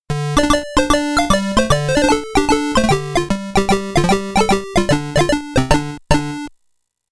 Pattern Clear; Ganbare Goemon Sound Treasure Box

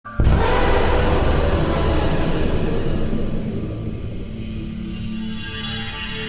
SHOCK; F.E.A.R. Perseus Mandate

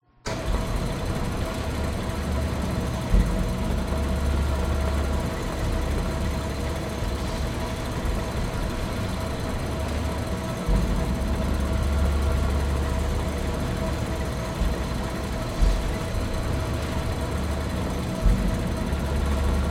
conveyor belt start; Conveyors

